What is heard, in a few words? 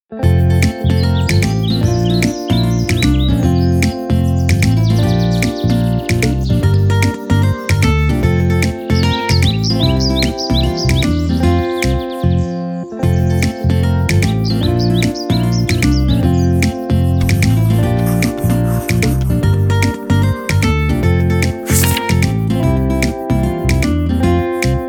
INSTRUMENTAL BODY PARTS